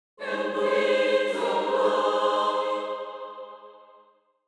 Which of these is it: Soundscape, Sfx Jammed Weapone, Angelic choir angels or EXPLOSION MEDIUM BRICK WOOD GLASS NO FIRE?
Angelic choir angels